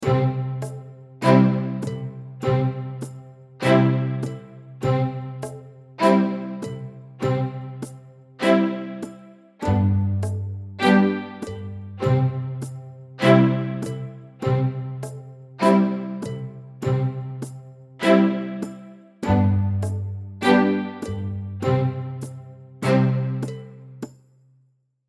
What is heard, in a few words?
slow tempo no melody, ukulele; London Bridge; Rainbow Ukulele Play-Along